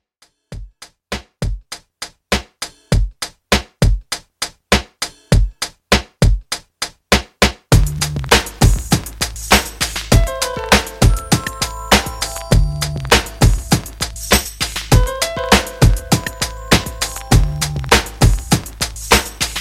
90s RnB ReDrum 100Bpm

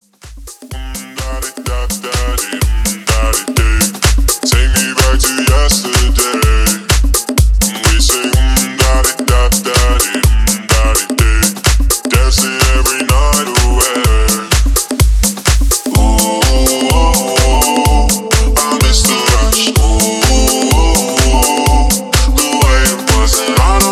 House Dance Edit 126 BPM

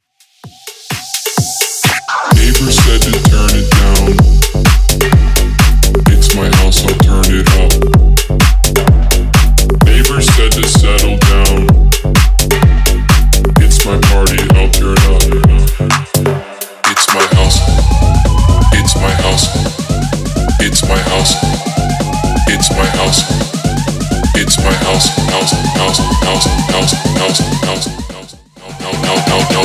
Bass House 128bpm

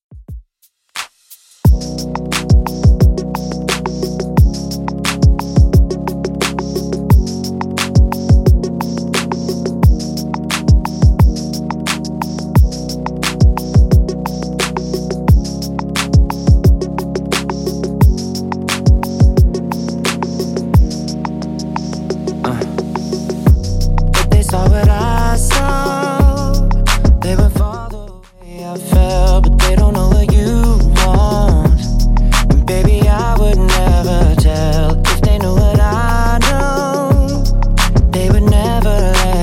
Top40 Redrum 88bpm

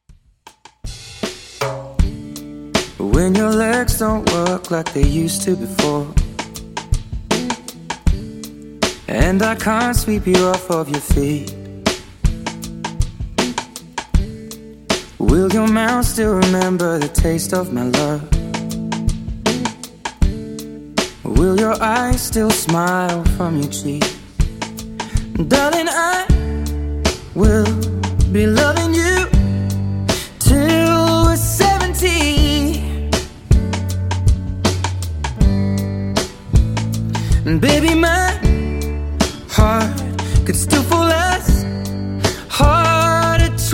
Dj Intro Outro 79Bpm

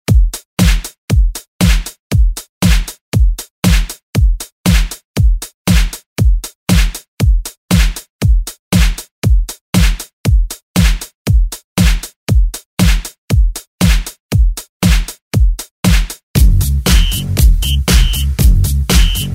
Remix 118bpm

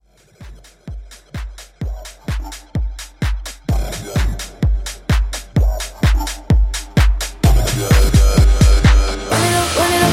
Mashup; Remixfreakz

